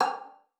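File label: finger F5, violin; Xsample VOL-5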